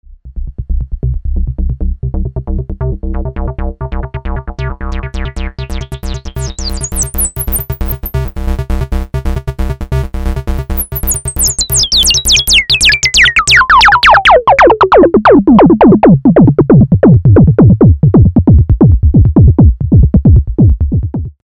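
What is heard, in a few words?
filter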